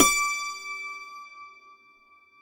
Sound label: DULCIMER D6; SoundBytes; Early Patches